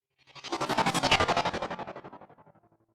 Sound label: space craft passing pulse stutter